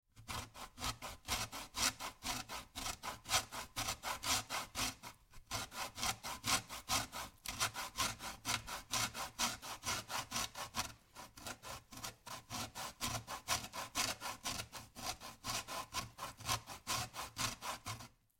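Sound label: lemon or lime sounds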